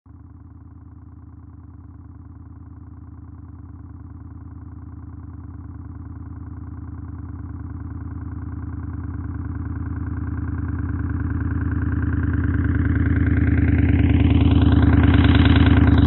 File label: moto 30 kmph; Fisica I; movimiento